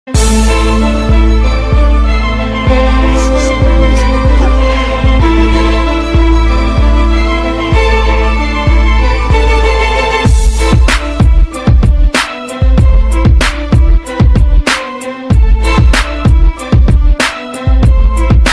Hard Orchestra Beats

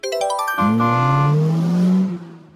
Camper Horn

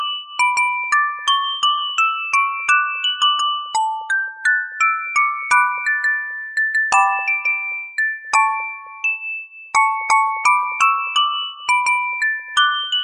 music Box Remix

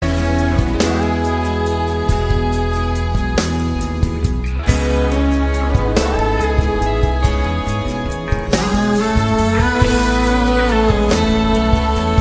Romantic Melody

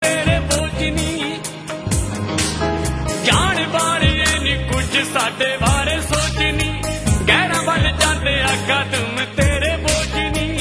Punjabi

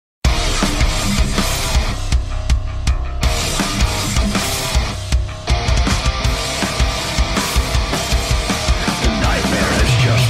Game Over

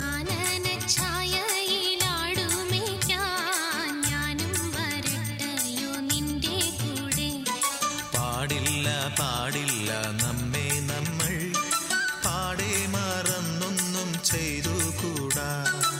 Malayalam Comedy song

